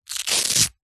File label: surfboard leg rope leash velcro rip